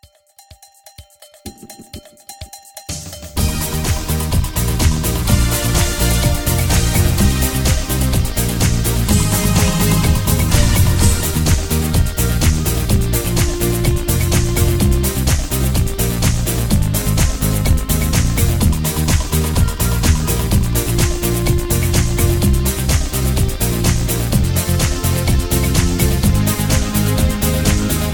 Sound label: Hoedown Patter